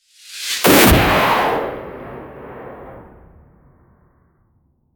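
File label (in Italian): 8 stelle cadenti che passano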